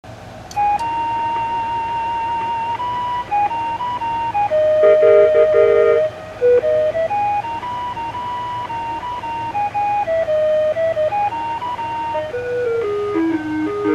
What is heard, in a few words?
chime